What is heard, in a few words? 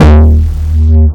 Root D#, Bass; Vengeance Samples; Vengeance Ultimate Bass